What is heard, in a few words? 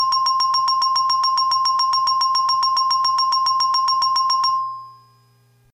buzzer beater ding ding ding